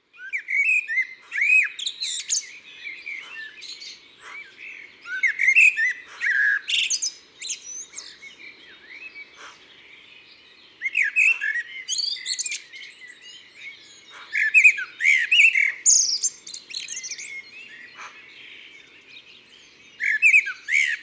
Merle noir, ABC des chants d'oiseaux

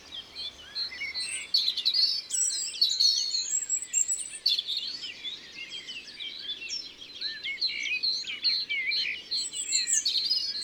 Rougegorge familier, Concerts naturels de l'Isère; Studio Les Trois Becs

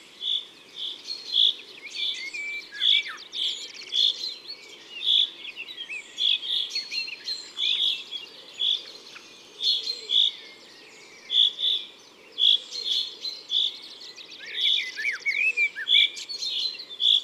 Pison des arbres cris; Isere; Concerts naturels de l'Isere; Studio Les Trois Becs

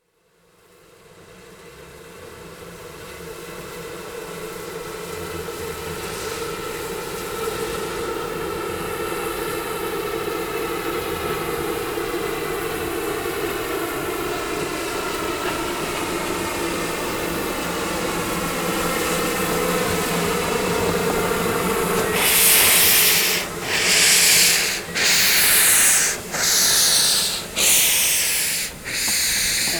Le Mastrou quitte Lamastre, Ambiances Sonores d Ardeche; Studio Les Trois Becs

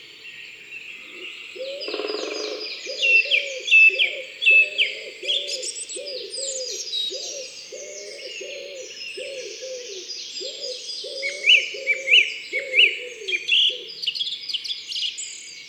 Pigeon ramier, Concerts Naturels de la Drome; Studio les Trois Becs

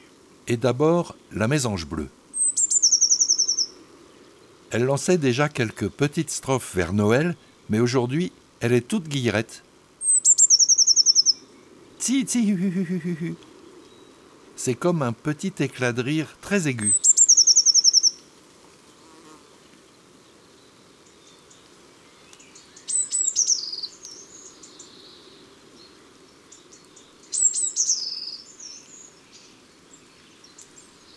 Janvier La Mesange bleue

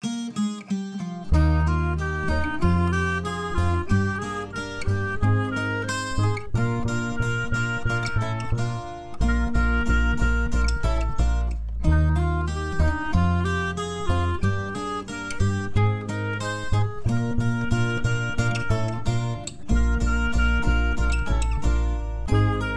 La fete des insectes INSTRU

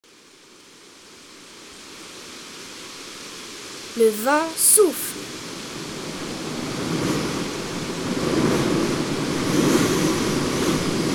Le vent; Mon imagier sonore de la nature

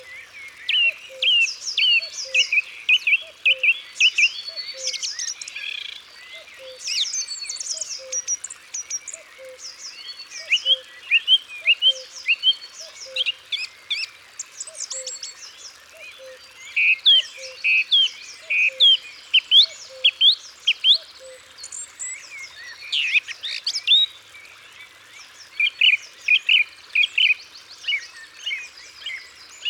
Grive musicienne, Isere; Concerts naturels de l'Isere; Studio Les Trois Becs

